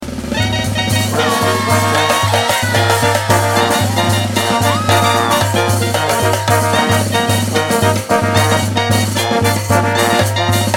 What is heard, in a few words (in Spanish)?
tres clarinetes instrumental